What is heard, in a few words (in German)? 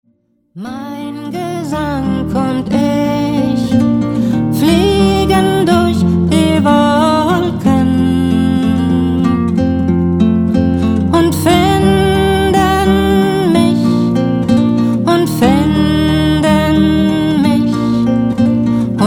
Mein Gesang und Ich